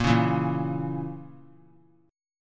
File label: Bb Diminished Bb, Chord; strummed; JGuitar